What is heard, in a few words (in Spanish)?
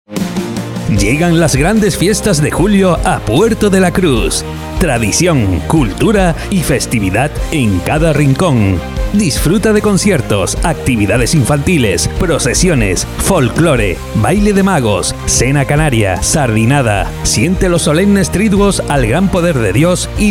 fiestas; fiestas puerto de la cruz 2025; especial embarcacion puerto de la cruz